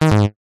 multimedia game sound basic digital retro incorrect error negative